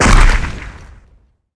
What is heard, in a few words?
skill explo